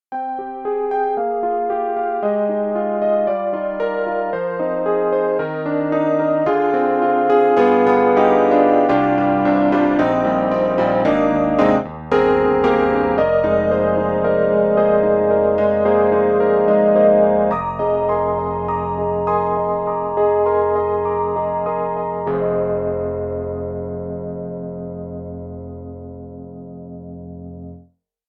Yamaha CP80 Chorus Glimmer Chorus; Steinberg; The Grand 3

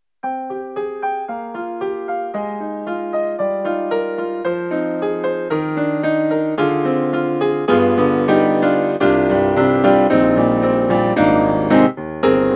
Wavetable Synth General MIDI Mode Grand Piano; SoundMAX

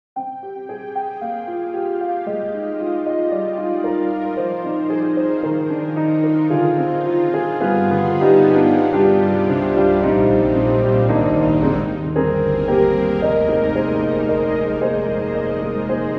Softly Flanged; Steinberg HALion 7; Verve

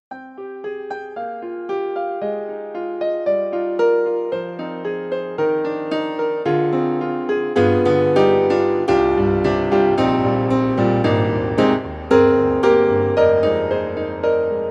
KORG KRONOS 2 German Grand; L Mays

